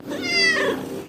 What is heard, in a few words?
meow; floki flokers